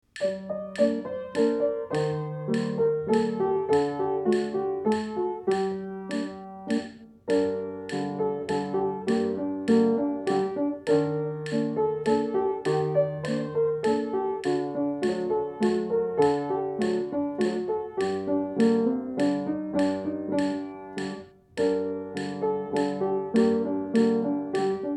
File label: Buttercup piano